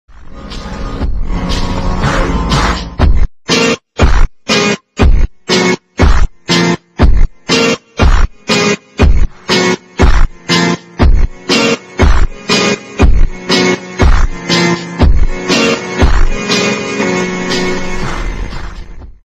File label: slowed reverb